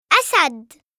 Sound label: lion